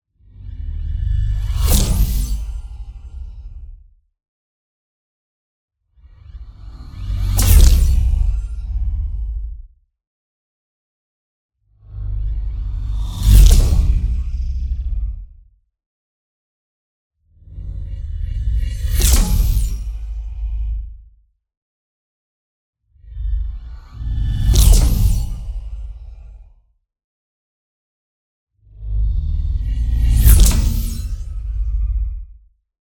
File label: NEON WHOOSH LARGE FAST; Cosmotron; boom library